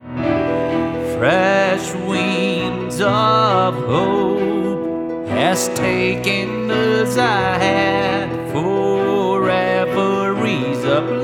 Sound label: Orchestral Sample